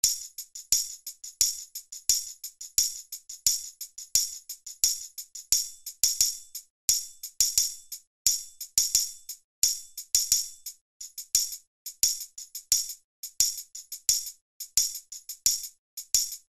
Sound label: tambourines